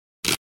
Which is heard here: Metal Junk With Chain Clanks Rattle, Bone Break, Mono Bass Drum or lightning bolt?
Bone Break